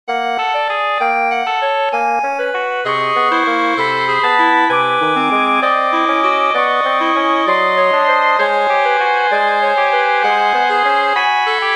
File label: to sax quartet; era o dito popular